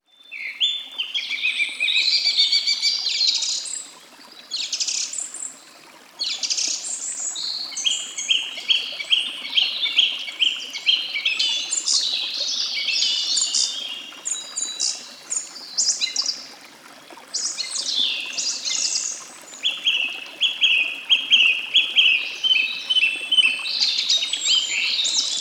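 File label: sounds of birds in the forest by a stream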